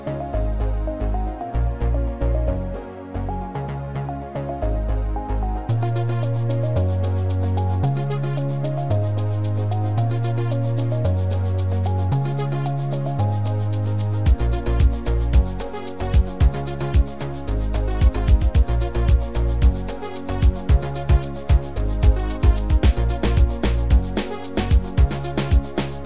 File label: Christmas moods